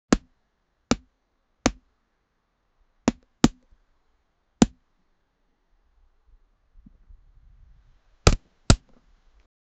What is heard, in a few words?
a soccer ball hits the, soccer